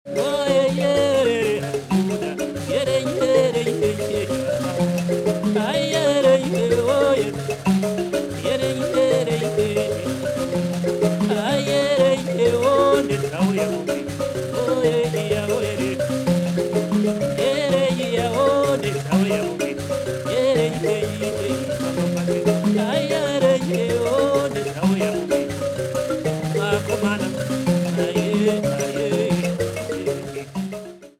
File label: mbira